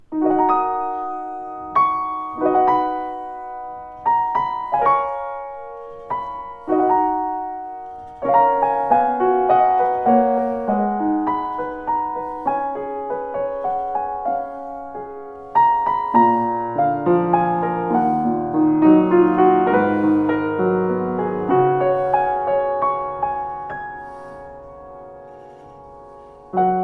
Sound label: Grotrian 110, piano